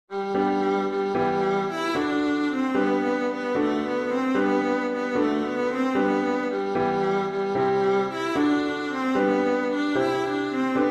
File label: viola and piano; i saw three ships tune b